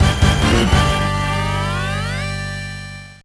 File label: Jingle, Stage Clear